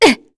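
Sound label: Vox Damage, Korean; Glenwys; kingsraid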